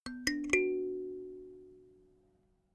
notification kalimba triad up minor low

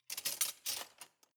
cutlery large multiple drawer place soft

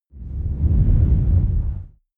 whoosh dark storm soft

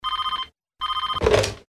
phone ring and pickup

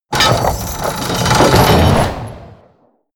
catapult siege trebuchet medium shot

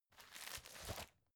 folder file jacket turn

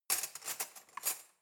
cutlery knife drawer rattle

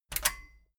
metal button stereo click disable fast

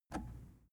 plastic button scratch push disable

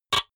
typewriter single

